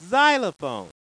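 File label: xylophone; My Science Fair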